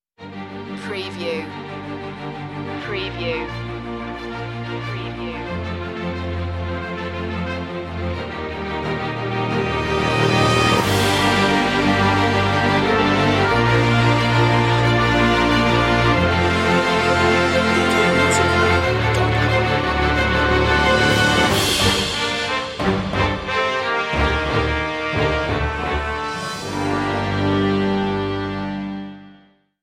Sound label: no choir